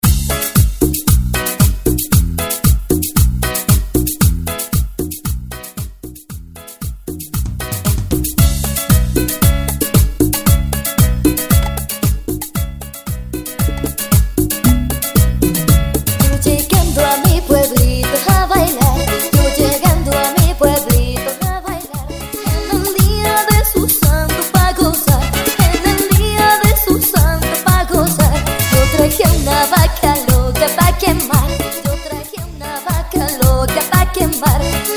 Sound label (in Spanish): Intro Simple 115 Bpm